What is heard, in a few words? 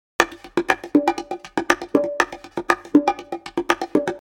Bongos Percussion Loop Processed 120 Dm, deep organic percussion; Bongos Percussion Loop Processed 120 02 Dm; basic wavez deep organic percussion